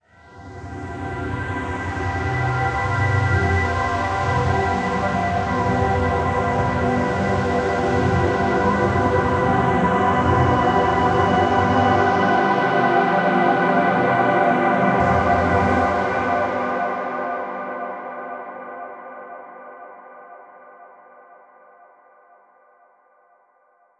Scenic Hybrid Instrument Double Layer Macro Automation; Reason Studios Reason 11